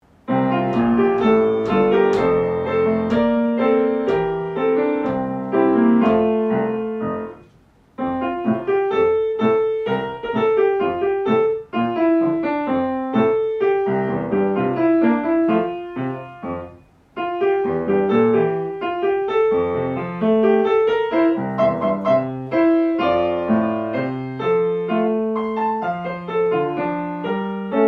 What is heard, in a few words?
music